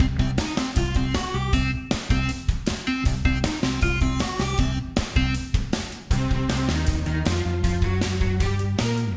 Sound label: City Platforming MIXED; Ben 10